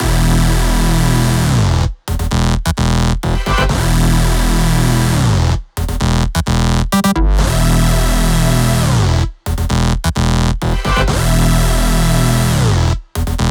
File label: 128BPM Synths Root E; Vengeance Samples; Machine Kit